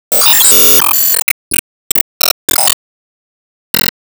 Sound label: Ingame Result Rank 3rd